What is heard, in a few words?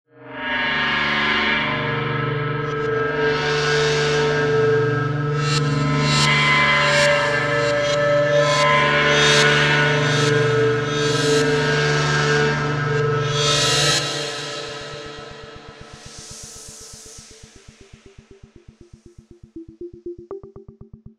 Fear